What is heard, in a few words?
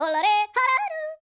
YODEL, World Voices; ILIO; Vocal Planet